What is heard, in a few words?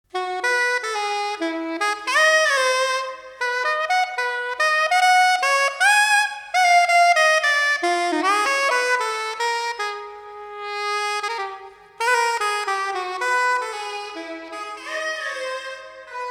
reverb prefader, Pre-fader aux send; Mixing for God audio examples